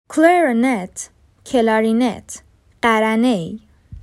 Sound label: Clarinet